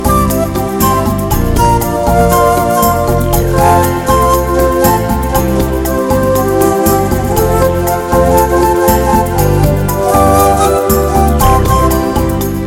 Beautiful Bolero

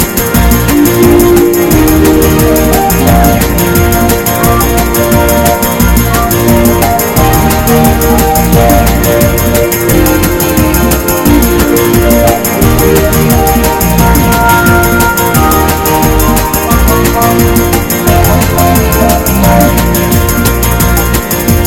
A Beautiful Rock

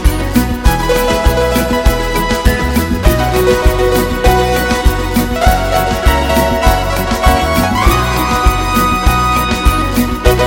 Smooth and Fast Pego

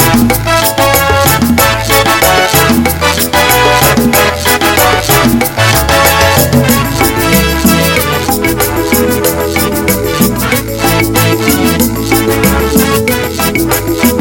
Slow and Smooth Cumbia

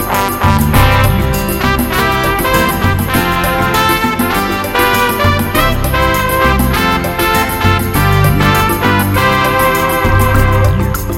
Hot Miami Music

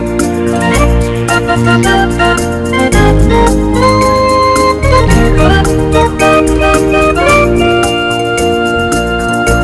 Super Jazzy Lounge

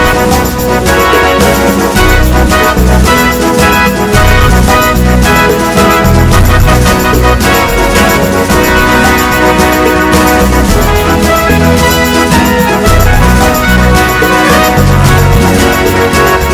Marching Music

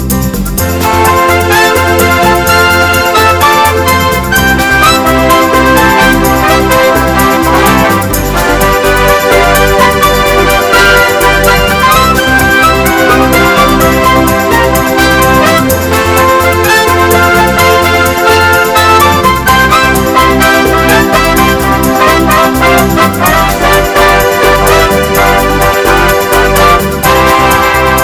Unusual and Pretty

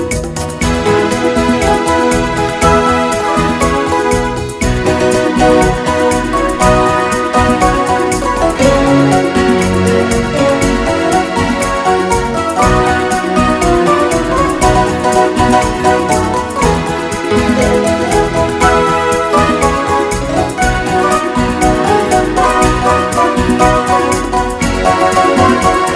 Wonderful Sounds

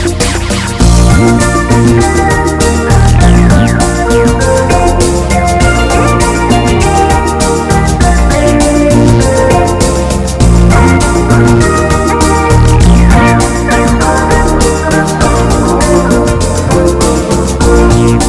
Happy Groove

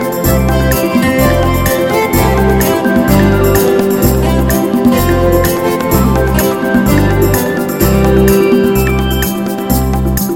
Great Unplugged Beat

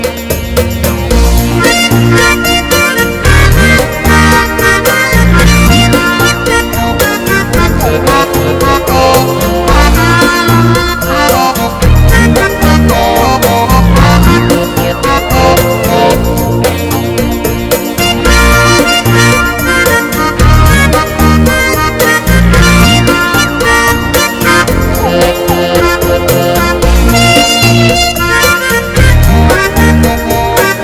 Slow and Smooth

